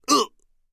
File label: Damage; Quincy